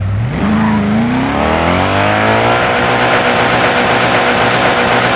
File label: koenigsegg agera; sgmcars